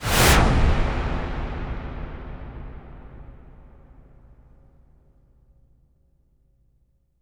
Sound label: IMP WIPE, Cinematic Impact